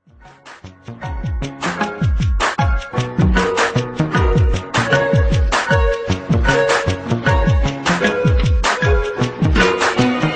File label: drums